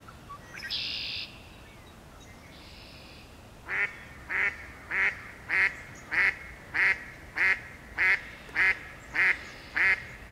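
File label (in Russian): samka kryakvy zovet izdaleka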